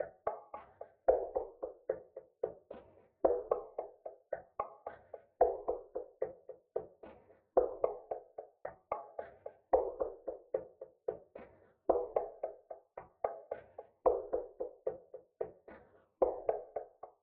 Drum Loop - 111 BPM Percussion, RnB; Loop Stems; Forever

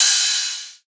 Ride, Techno Drum Shots; Drum One-Shots; Ghosthack; Free Techno Drum Shots 2024

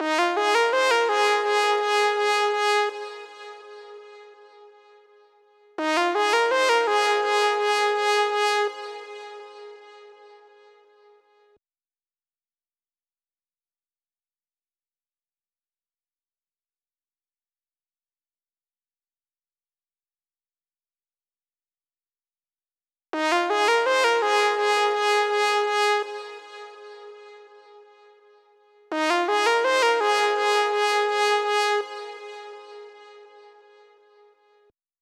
166 BPM G# Maj Lead, melody loop stem; Loop Stems; Cymatics; 2022 Melody Collection - Wavy; Foreign

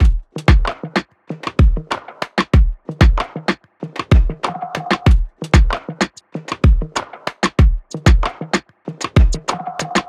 Drum Loop - 95 BPM; Underscore; Cymatics; Infinity Drum Collection

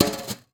Steel Brush, Snares; Ghosthack - Free Snares 2023

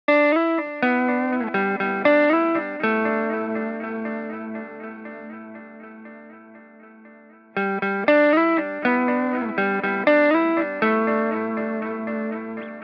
120 BPM A Min Lead Guitar; Loop Stems; Cymatics; Grief

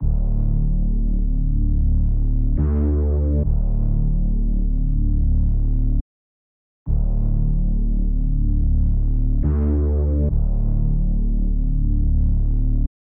140 BPM D Min Bass, Drill; Loop Stems; Cymatics; 2022 Melody Collection; Empty The Clip

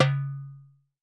Doumbek - C#; percussion; Cymatics - Infinity Beta Pack 2.0; Zeus Percussion Collection

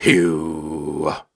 Grunts and Chants, Viking Vocal

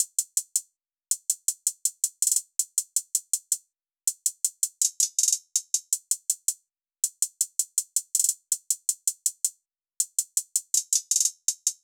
Future Hihat Loop, 162 BPM, Trap

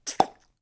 Cork Pop Quiet; Home & Office

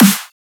Dubstep Snare; Ghosthack; Dubstep Sound Arsenal

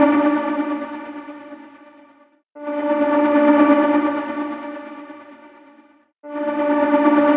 Pad Loop 130 D, Techno; Loops; Pads; Ghosthack; Free Techno Sounds 2024

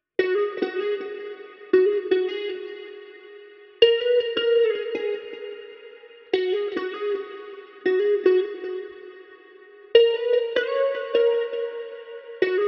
157 BPM D# Min Guitar Lead; Melody; Loop Stems; Cymatics; 2022 Melody Collection - Various; Bellagio

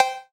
Percussion (Cow), Hip Hop; Drum One Shots

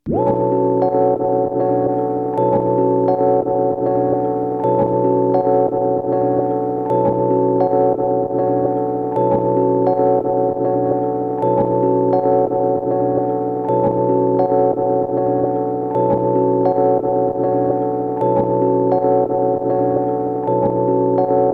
Tape Loop Melody B; Tape Loops; Melodies; Cymatics - Eternity Sample Pack

